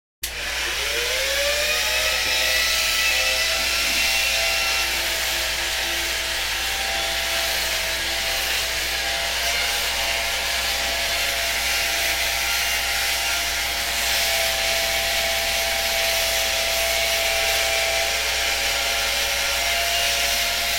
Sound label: Electric Shaver Turn on Shave & Turn Off; Household